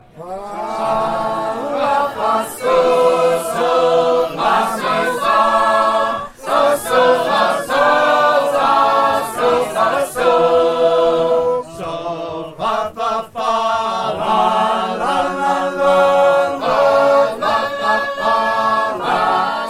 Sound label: Sacred Harp Singers, live; 276 [Bridgewater]